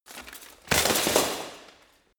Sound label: bodydrop